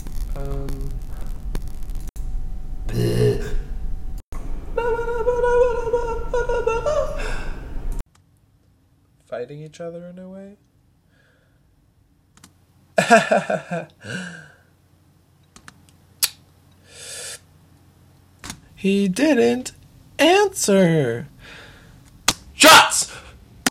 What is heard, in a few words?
breath